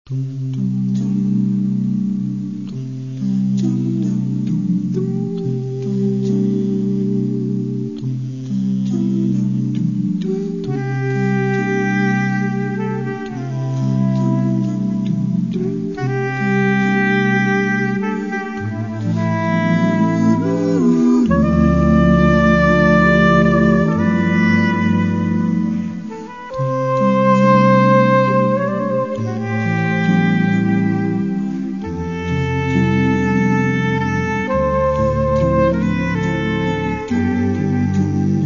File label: quiet music silent night